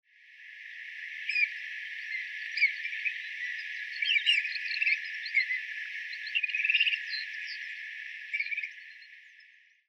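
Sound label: Dunlin, hyogo; koe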